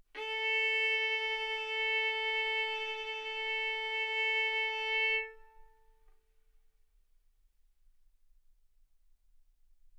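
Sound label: Violin arco mf A string A4 B4 stereo; sul A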